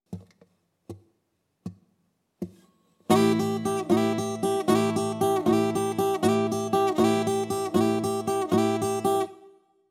Open Tunings For Blues Rock Guitar

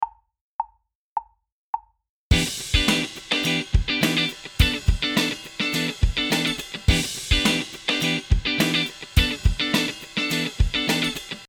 Minor 7ths

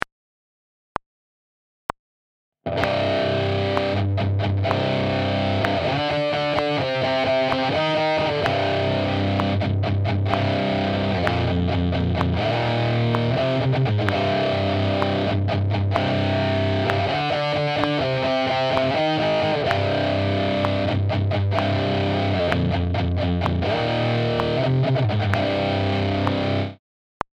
Beyond Powerchords

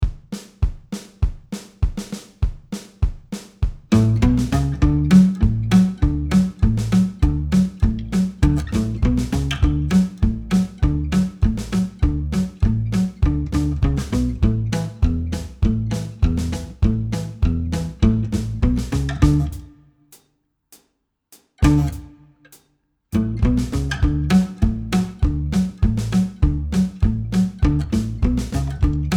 Country Guitar; Learn Country Guitar

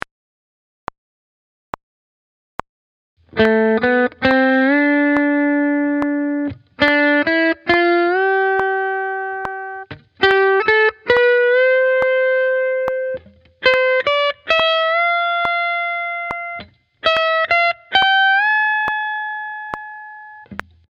Paying Guitar with Expression

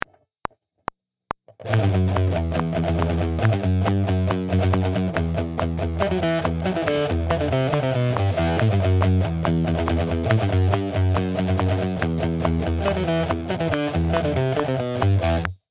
Metal Rhythm Guitar

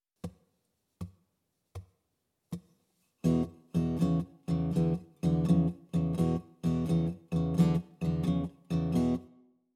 Open Tunings For Blues Guitar

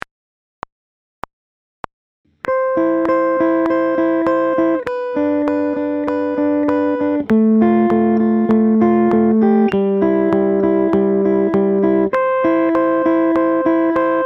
Pop Sixths

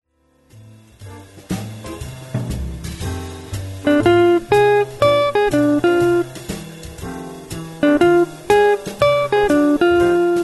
guitar jazz blues; wes montgomery guitar jazz blues lesson

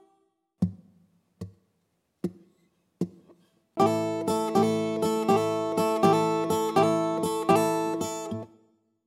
Open Tunings For Blues Rock Guitar

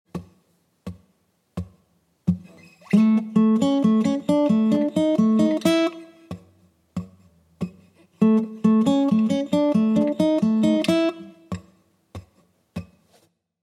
Blues Guitar Turnaround

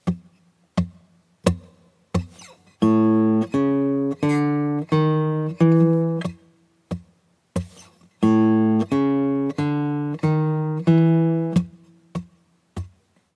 Blues Guitar Turnaround

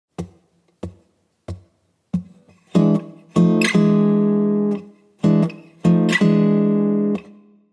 Blues Guitar Turnaround

